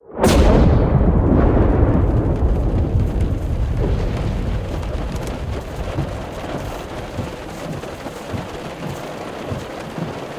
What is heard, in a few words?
rain in; Blind Drive